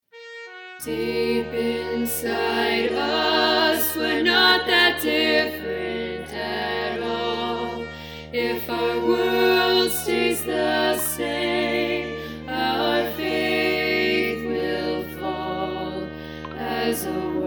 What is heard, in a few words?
all voices